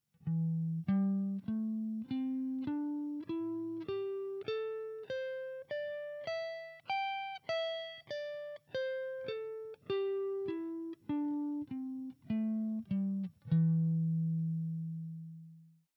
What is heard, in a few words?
Pentatonic Pattern, guitar